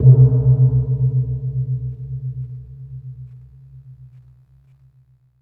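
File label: GLONG, percussion metallic; Rarefaction; Poke in The Ear With A Sharp Stick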